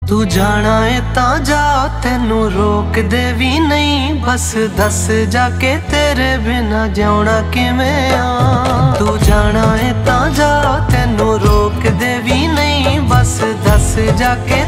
Ringtone

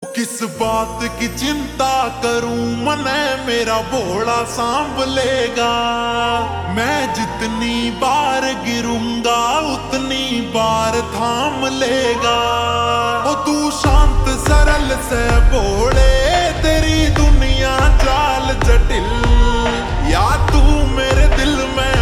Ringtone Slowed Reverb

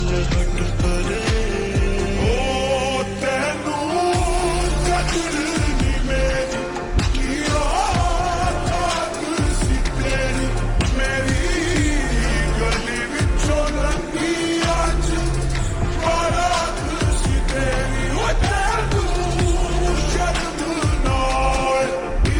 Slowed Reverb Ringtone

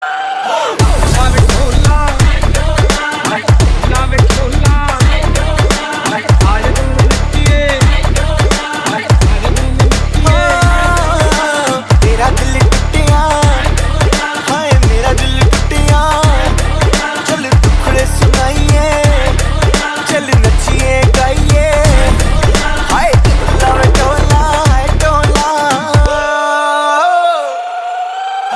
Ringtone

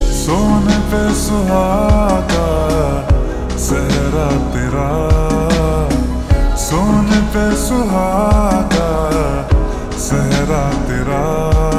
Ringtone Slowed Reverb

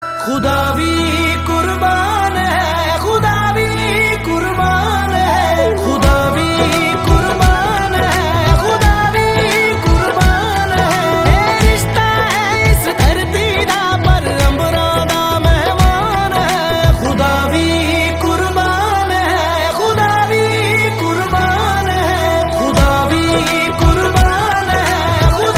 Ringtone

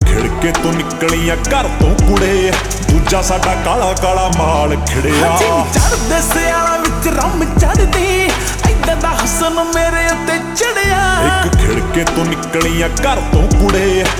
Ringtone Slowed Reverb

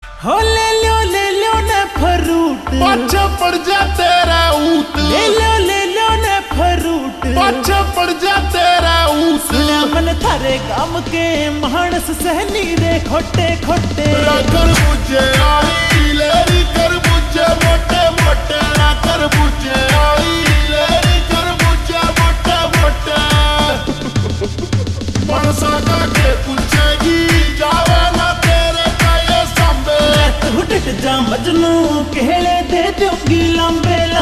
Ringtone Slowed Reverb

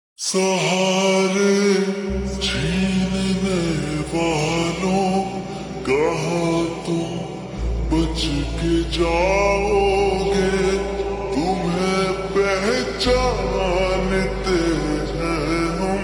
Slowed Reverb Ringtone

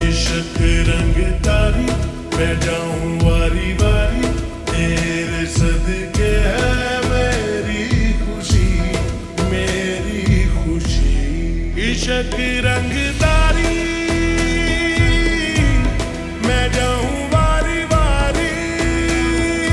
Ringtone Slowed Reverb

